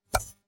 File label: space tap, keyboard